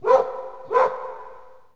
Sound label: dog; Tony Hawk's Project 8; XBOX; houses